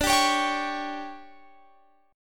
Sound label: D Major 7th Suspended 4th, Guitar, Open F; DM7sus4; strummed; x,21,19,20,22,20